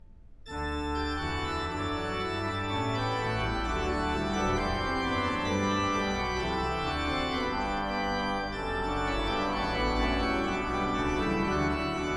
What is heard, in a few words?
BWV 638